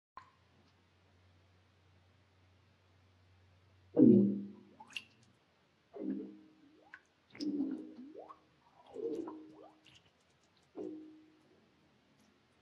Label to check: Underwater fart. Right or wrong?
Right